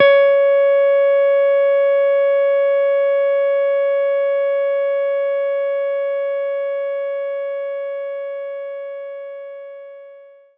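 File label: Jazz Guitar C#5; knights try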